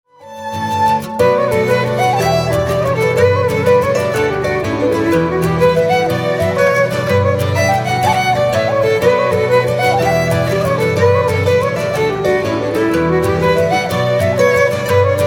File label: jig